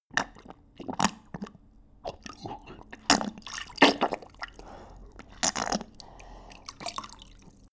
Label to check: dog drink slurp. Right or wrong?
Right